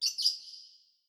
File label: Ambience cavebird; adams venture origins